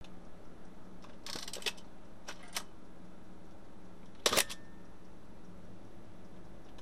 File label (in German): klack; RB67